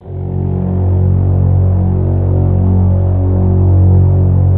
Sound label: LOW F#1, SFT LOW VLNS; Q Up Arts; Denny Jaeger Master Violin Library